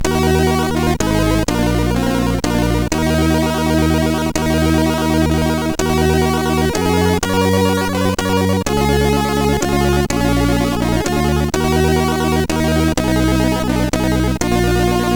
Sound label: Greensleeves, MOS6581R4